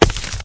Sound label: rock break